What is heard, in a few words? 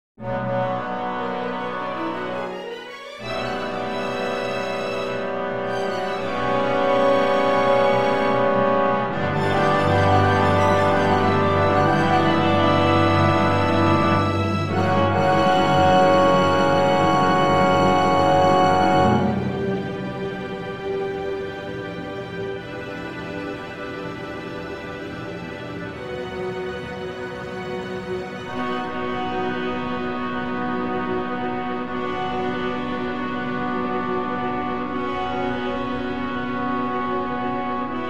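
symphony 4th movement start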